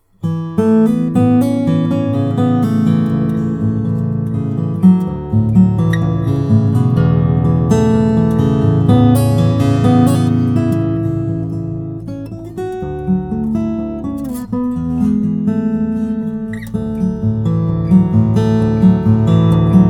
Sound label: bariton, guitars